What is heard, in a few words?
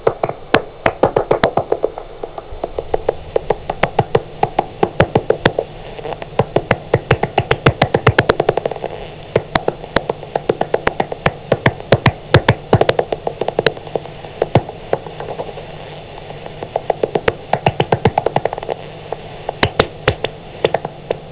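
eptesicus serotinus; fauna; sismisi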